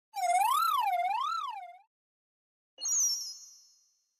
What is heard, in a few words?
moods swoon